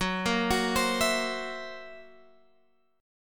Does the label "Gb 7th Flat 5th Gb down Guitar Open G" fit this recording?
Yes